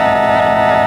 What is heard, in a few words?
Sci Fi Organ (single note); Movie Bits